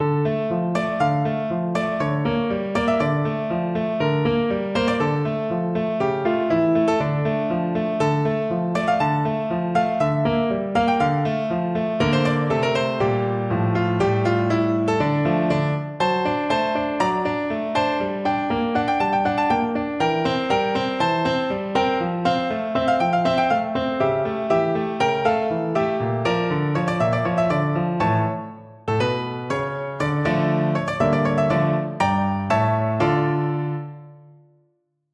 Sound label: hungarian dance